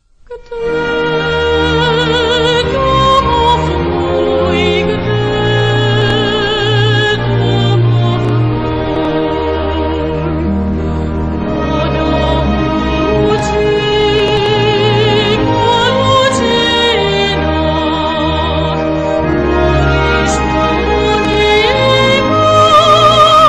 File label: National Anthem; Czech Republic